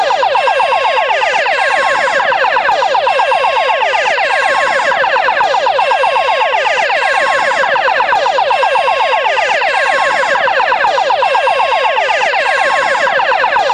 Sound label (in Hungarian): atego, szirena